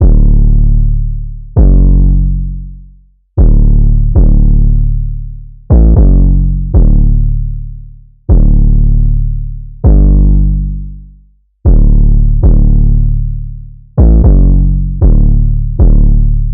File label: 808 loop 116 D; Slate Digital BASSHOLE Sample Pack; 808 Loops